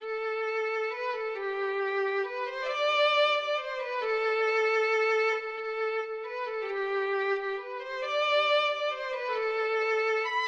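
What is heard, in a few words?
violin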